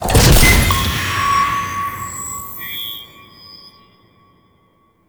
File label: Squad Link Satellite Drop Off; Railjack